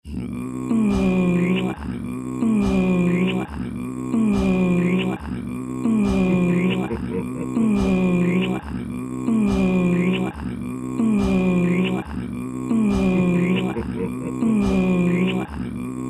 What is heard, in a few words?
Weird Chant Kiss Loop